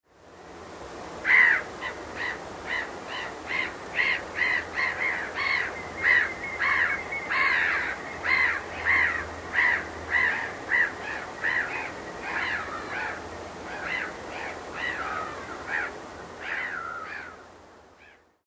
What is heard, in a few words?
black headed gull, nocmig